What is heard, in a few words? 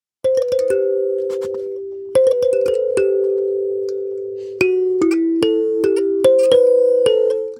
Kalimba, African; Sonic Foundry (Sony Creative Software); Continental Drift Aftershocks